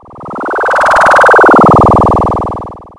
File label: fan